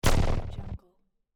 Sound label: scifi heavy energy weapon shot